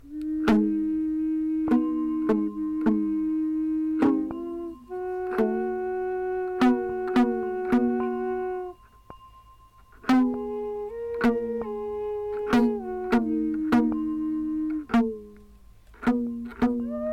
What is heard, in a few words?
love And Taiko